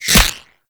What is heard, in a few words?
Ghost Expel Spirit Impact; Warframe; PriestQuest; RellGhost